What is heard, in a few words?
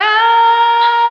female, Voice; Notes